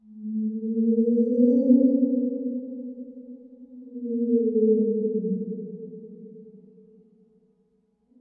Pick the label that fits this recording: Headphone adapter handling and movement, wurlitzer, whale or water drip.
whale